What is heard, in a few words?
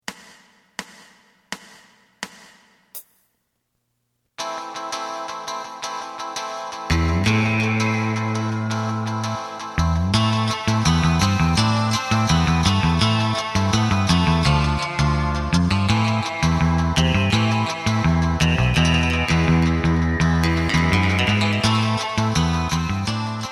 drum